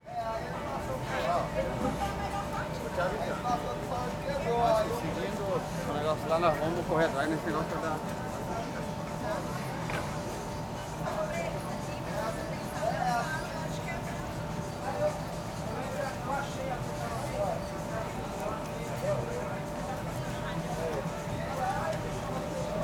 Ambiencia Setor Comercial Sul de Brasilia em frente ao Comercio; com passantes

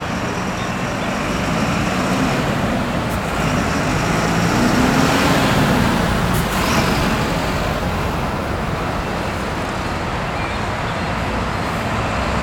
Onibus passando pela rodoviaria do plano piloto com passaro longe; maquinas; colecao sonora do cerrado

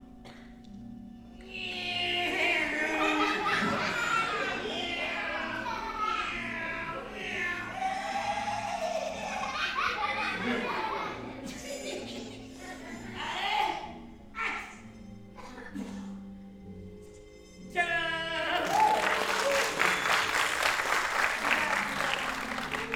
Apresentacao palhaco com musica, criancas, mulheres, risadas e aplausos; multidao

